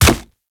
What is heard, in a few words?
Infested Charger Step Default